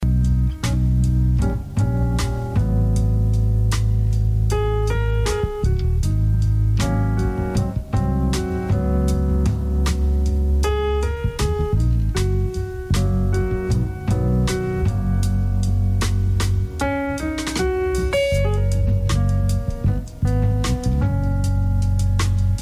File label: jazz